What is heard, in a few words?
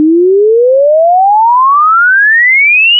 sine sweep; OM-SoX hoaencode